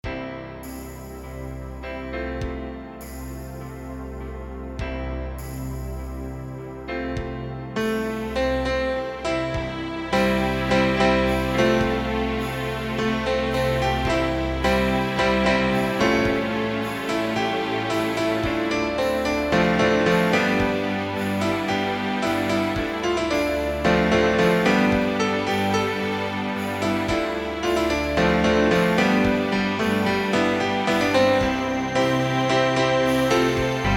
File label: SATB instr